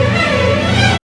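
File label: string run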